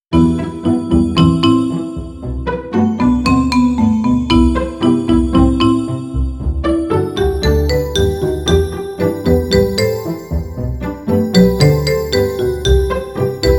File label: cheerful entertainment no drum pizz; by burak sound bank; cheerful vol 15